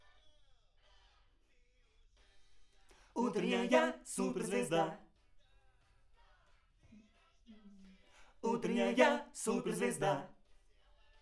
AKG BOYS

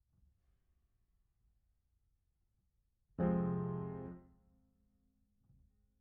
Piano Mono

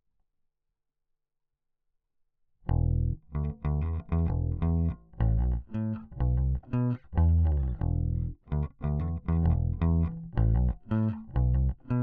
Bass

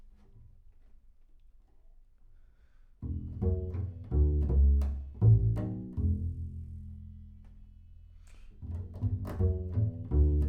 Bass Room

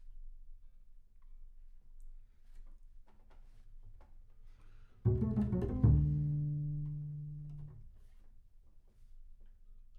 Bass Room

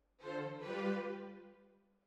Hall, Jazz for piano

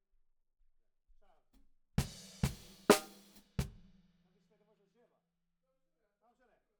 Snare Bot

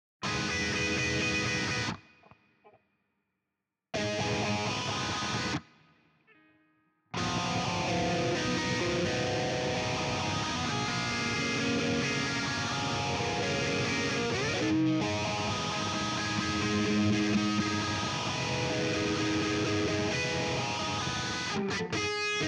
Guitar

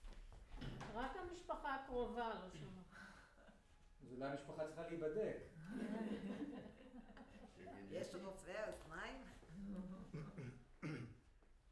Room, Choir

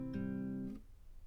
GTR

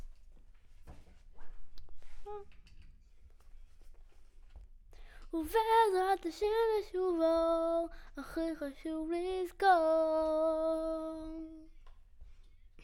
Children Choir; Studio Bekol Children Choir

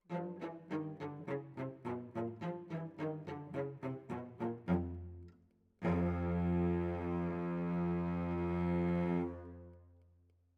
Cellos

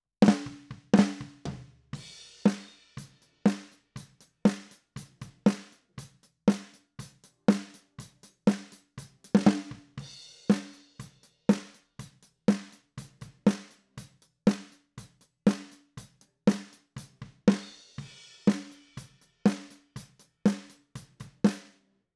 SNR TOP

